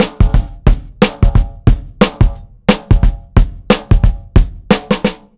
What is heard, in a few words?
beat, Roots Funk; USB Soundscan vol.41; AKAI